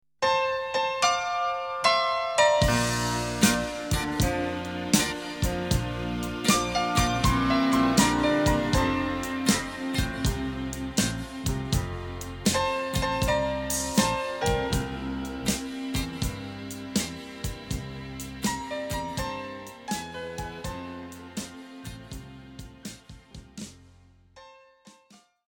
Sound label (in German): Abschiedsblues